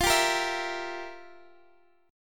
F Major 7th Suspended 2nd Suspended 4th, F, down, Guitar, DADGAD